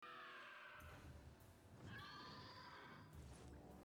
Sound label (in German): Ruf Pteranodon